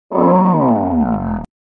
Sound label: thwomp